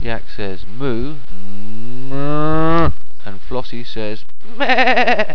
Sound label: moo